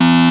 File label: buzzer